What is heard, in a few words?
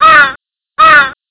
crow